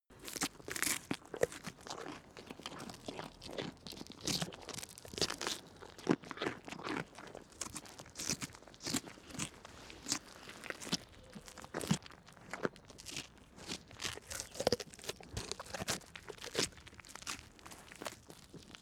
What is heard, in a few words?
goat eat carrot